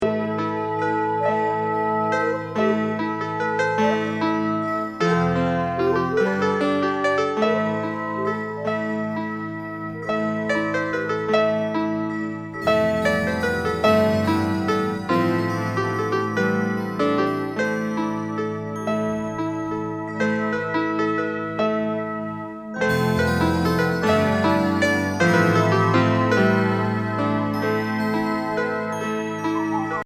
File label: Medieval Waltz